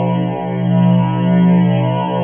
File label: humming